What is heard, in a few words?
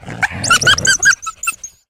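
plaintive angry anxious coyote squeal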